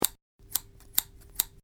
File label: Cutting Scissor Snips